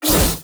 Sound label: preattack; dota; dark seer